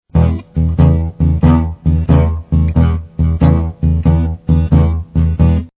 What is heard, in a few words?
blues guitar